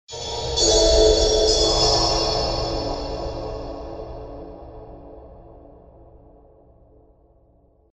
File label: Horror